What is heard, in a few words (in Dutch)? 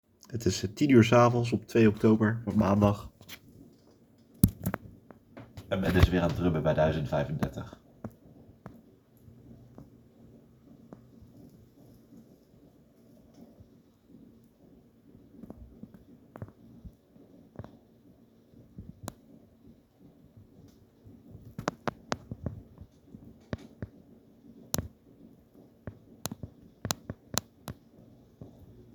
Drummen na 2200